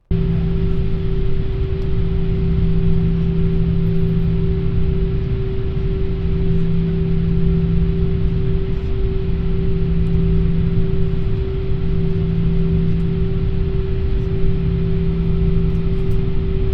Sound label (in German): im Flugzeug; Brasilien; Fieldrecordings